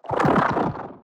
growl small; fistula

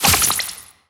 box of spiders

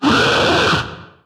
chase growl; fallen

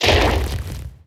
bounce; carrionqueen

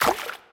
puddle

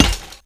scythe break

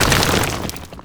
maggot enter ground

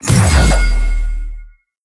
divine intervention

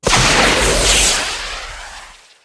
rocket launcher; gfuel

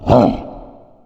death summon scythes

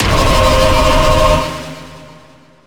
light ray fire; dogma

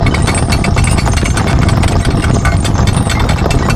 ball and chain loop

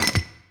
urn close

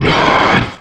monster yell

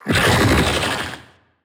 vocal; hollow

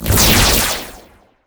laserring medium

